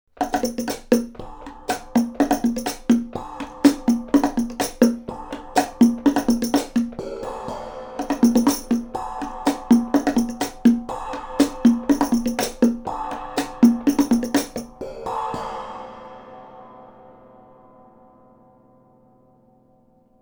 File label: Perc A B, R-121